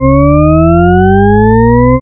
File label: sweep; Nyquist Example